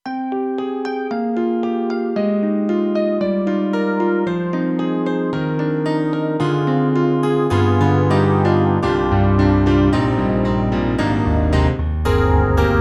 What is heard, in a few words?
Ac Grand + E.Piano; M3 Version 1 Wave; KORG M3 XPanded